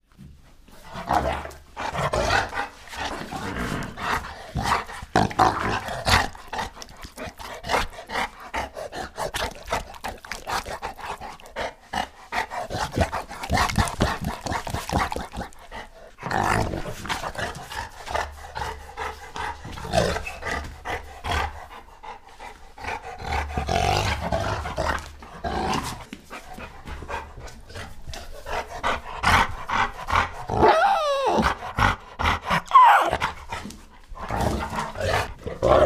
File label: Bulldog Play Viciously, growls